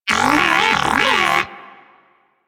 Moa Pet battle end; Warframe; Pets; Tenacious

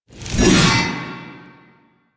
Backstab Impact; Warframe; Warframes; Pax Duviricus

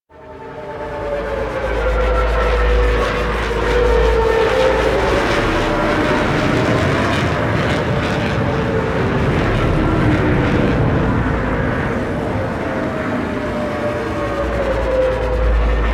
Dropship Spawn; Warframe; The New War; Sealab

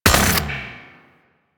Shot; Cycron; Charge Gun

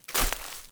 Grass Step; Characters; Ambient; Steps; Sprout Farmers

